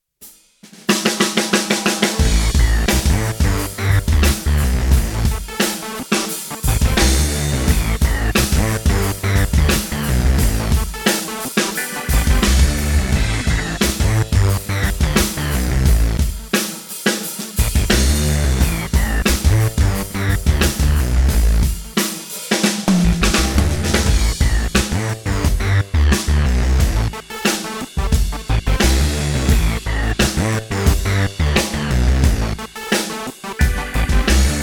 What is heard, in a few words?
Drum Playground